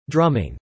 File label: drumming